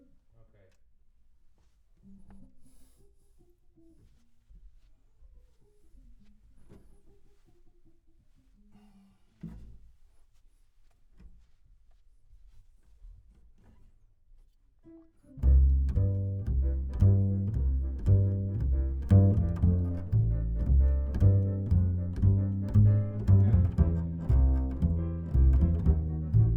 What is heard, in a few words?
Bass